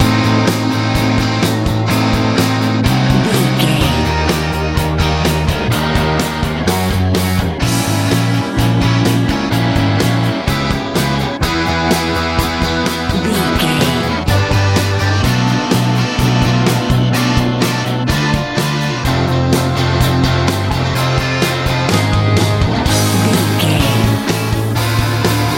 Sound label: happy warm big wind sprint life 90s rock